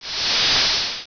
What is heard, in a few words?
GAS